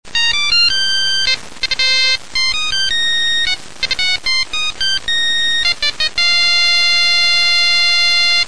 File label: fox fanfare; 20th Century Fox